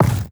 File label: stone drop, inventory; ui